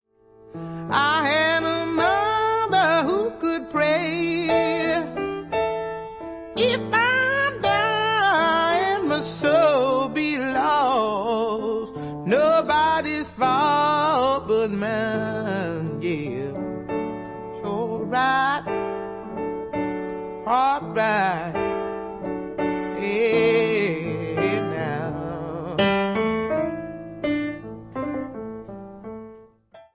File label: piano